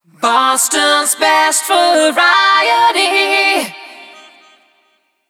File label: 125bpm Ab; WBMX 2017